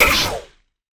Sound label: hit; toxifin